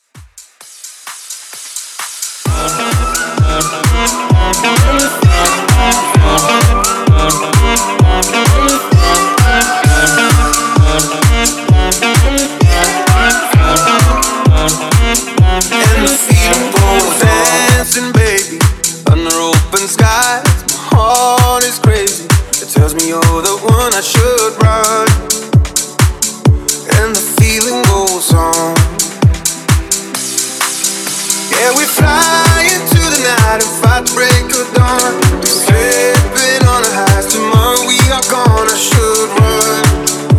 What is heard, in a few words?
Mashup House Edit 130 BPM